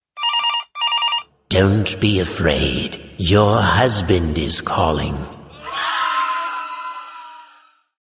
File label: scary husband call ringtone